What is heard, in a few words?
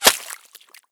water, bullet collide; STALKER; IWP